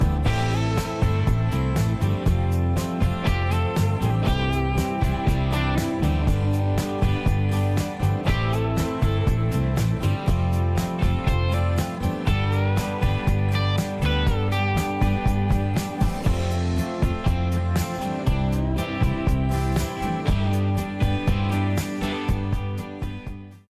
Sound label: Light Rock Guitar